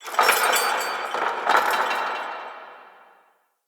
Chain Rattle Distant; Ambience; Crypt; Champion Crypt

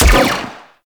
Sniper Rifle Shot; Crp Ar Sniper Rifle

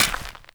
Step Dirt, Footsteps

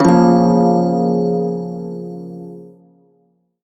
hirajoshi chord, shawzin; Warframe

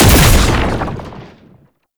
Lunge Impact; Warframe; Oraxia

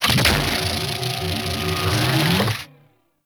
Super Weapon Laser Full; Warframe; Caliber Chicks

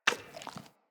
Camel Idle Chew; Khaban Camel Vendor; Vocalizations

